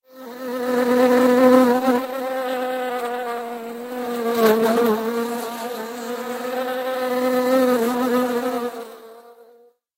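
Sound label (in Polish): pszczoła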